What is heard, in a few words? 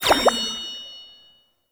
Vendor UI Close; Warframe; Nokko Colony; Vendors